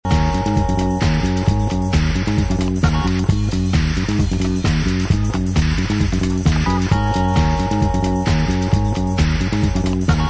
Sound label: disco reconnection